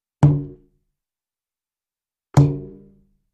Bassy Hollow Drum Im; impacts

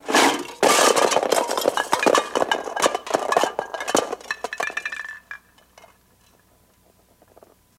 Cups Things Fall, ransack room

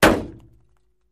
Metal Hit Car Hood, small impacts

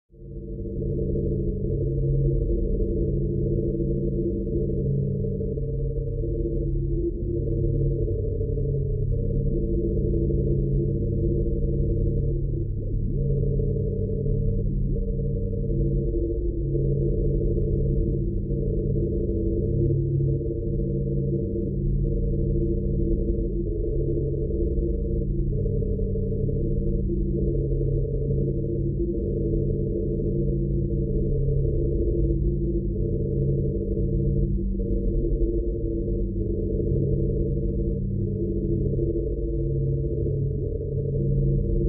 Computer Room Ambian

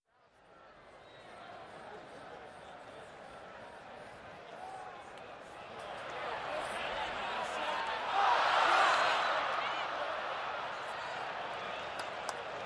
Football Crowd Idle, sports arena football

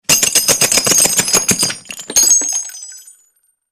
Machine Gun Burst Shell, eject shell

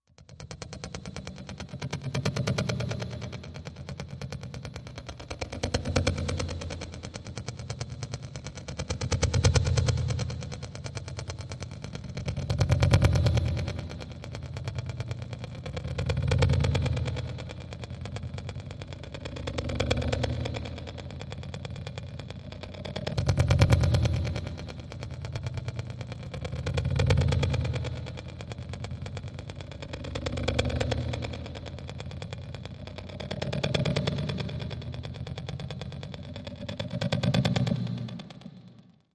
Tremor Intensity Mach, energy beams

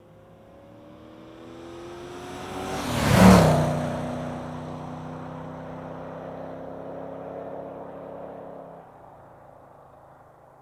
Camaro 1969 Super Fst, chevy camaro 1969

